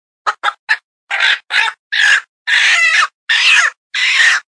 Pleading Chicken, predator calls

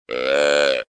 Estrus Bleat

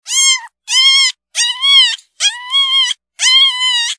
Canine Pups

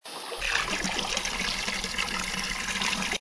Peeing Bull Moose

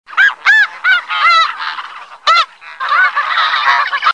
Snow Goose Calls

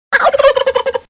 Gobbler Locator

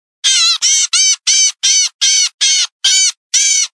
Cardinal Distress

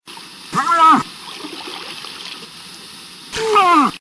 Moose Grunt'n

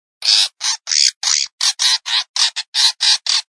Cat Bird Distress

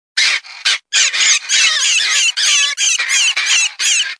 Grey Fox and Cottontail

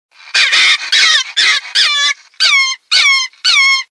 Grey Fox Pup

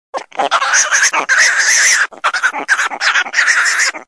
Baby Javelina, predator calls

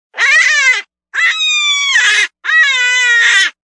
Jackrabbit

